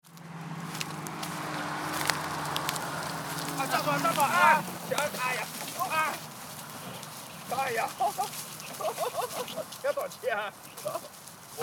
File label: dog and mouse